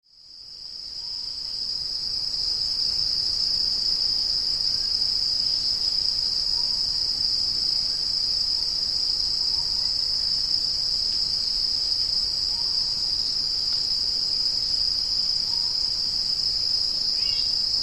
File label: rainforest sounds